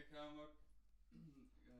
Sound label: Voc Fig8